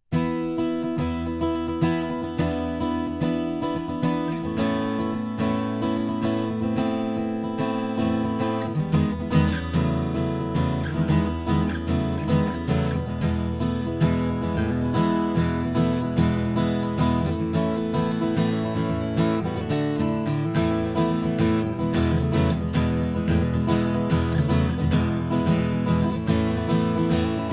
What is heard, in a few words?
strum raw, guitar